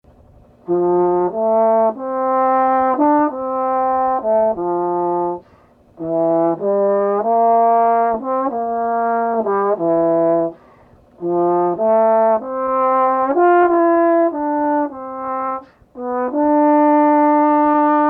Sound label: trombone andante